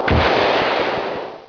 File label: FIRE; VIETNAM